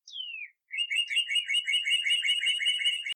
cardinal; minetest; ambience

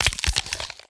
eat; minetest; stamina

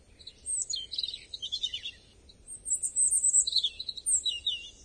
robin; minetest; ambience

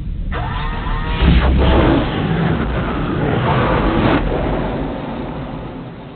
gate activate; minetest; stargate

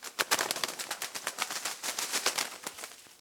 animal bat; minetest; mobs sky

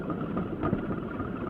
cart moving; carts; minetest

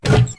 door; minetest; techpack; tubelib addons2

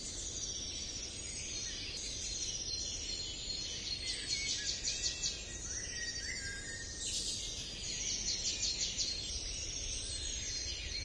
bird; minetest; ambience

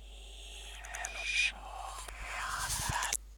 mobs monster; minetest; oerkki

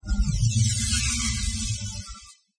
transporter; minetest; basic machines

